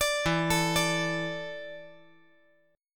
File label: E 7th Suspended 4th E down 5G 4C 5E 5A